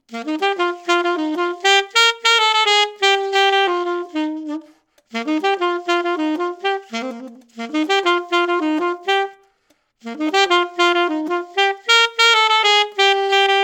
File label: Meyer Conn 7